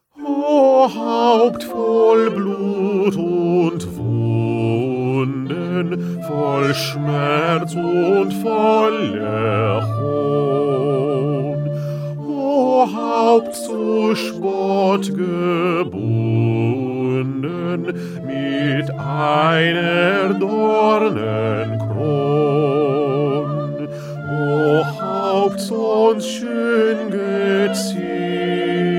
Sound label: Bass; Bach; St Matthew Passion